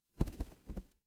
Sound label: animal wing flap